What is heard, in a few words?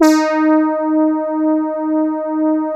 BIT BRASS, Vintage Keyboards; Big Fish Audio - First Call Vintage Keyboards